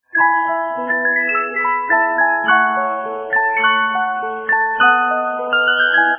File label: spring song; Reuge